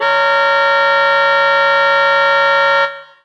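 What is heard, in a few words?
honking; troubeni; Mafie 1 cz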